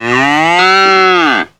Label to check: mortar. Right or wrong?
Wrong